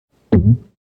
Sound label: swallow; tongue-fight